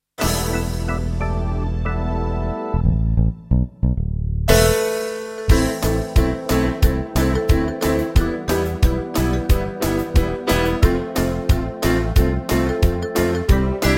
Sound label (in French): inst